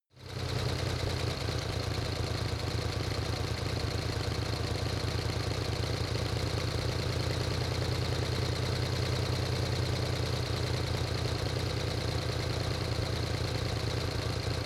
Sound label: motorcycle harley drive slow